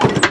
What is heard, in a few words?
gear shift, vehicle; bf2